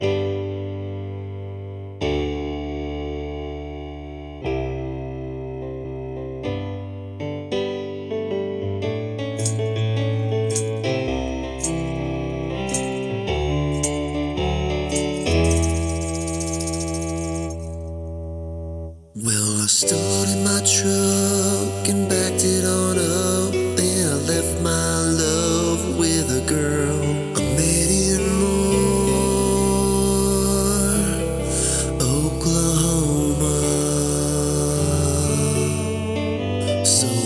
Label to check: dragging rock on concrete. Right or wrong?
Wrong